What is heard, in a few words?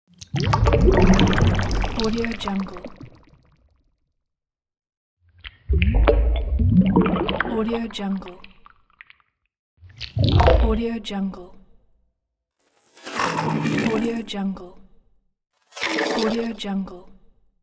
touch screen